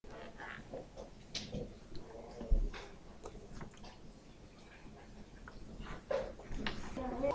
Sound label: clang